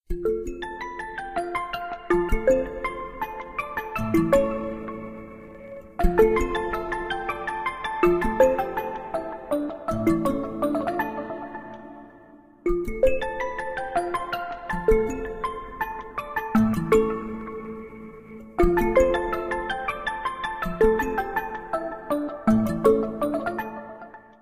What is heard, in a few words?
Coin Dance, alarms